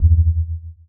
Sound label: Perc, drums; Touch Loops; BlissedOutBeats